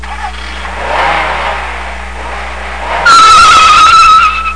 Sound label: car start